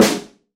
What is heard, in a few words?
Snare Acoustic